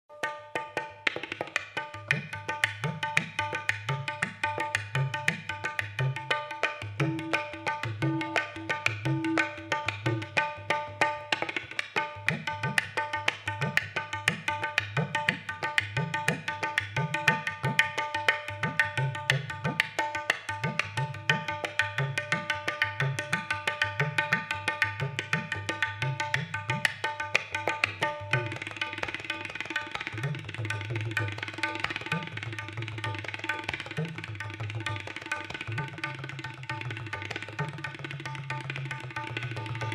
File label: Tabla